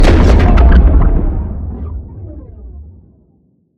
Sciweap Shot Large Gun Carbon Injector

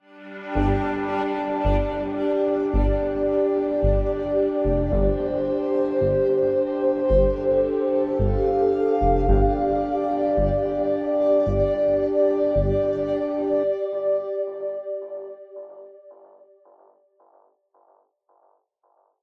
Winter Ambient Day

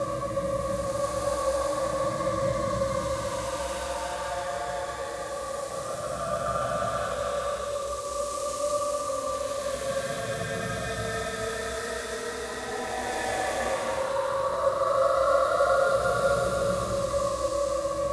doctor room; Dark Dimensions: City of Fog

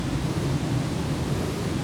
mage lord run loop

